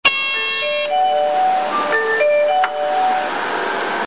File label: bus door; 京浜急行バス; 中扉ブザー (開); 羽田空港ターミナル間無料連絡バスと「りんどう号」では、中扉開閉時に従来のブザーに加えて大阪市営地下鉄で使われているドアチャイムが鳴ります。